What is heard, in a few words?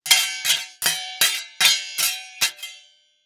Cartoon Metal Clinks